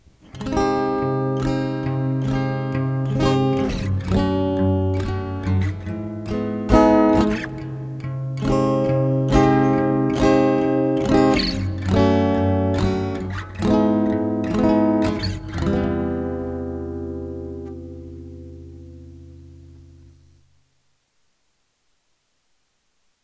reverb son mat; tc electronic g natural; Les réverbes, bien choisies pour un univers acoustique (plates, Hall, room, spring)

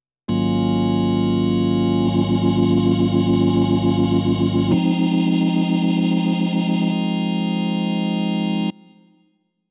son B3 slow fast, korg m50

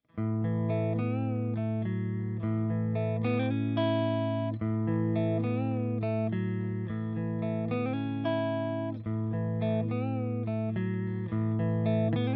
clair manche, gretsch

